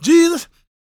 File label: GOSP MALE, Vocal Gospel; Vocal Planet Vol1 Gospel